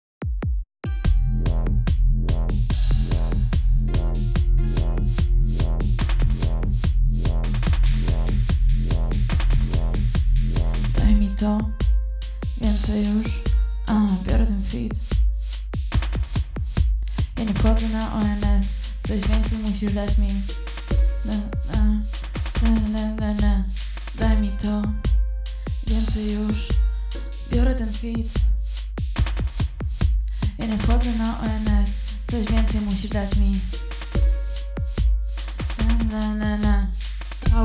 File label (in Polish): draft voc